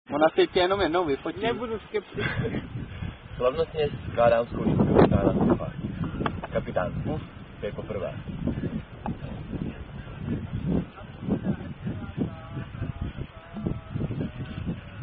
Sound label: Czech Version Of Are You A Cardinal Drinking Game; Kapit N Puff